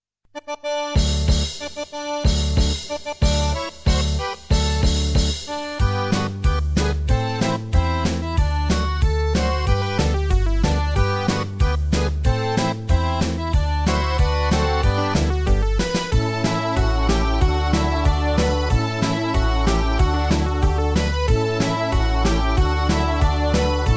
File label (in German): zirkuslieder playback